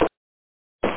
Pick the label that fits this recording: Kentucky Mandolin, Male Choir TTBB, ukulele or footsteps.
footsteps